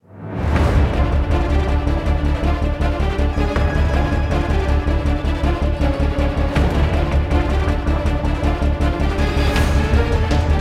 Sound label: Carnival Action; modern combat 5 blackout; android